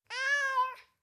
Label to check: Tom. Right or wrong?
Wrong